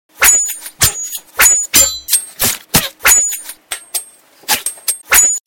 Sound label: sword